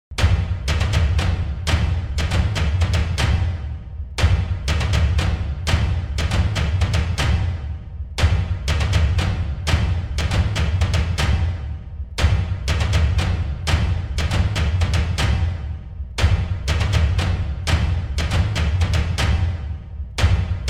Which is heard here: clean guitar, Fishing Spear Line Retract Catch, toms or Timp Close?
toms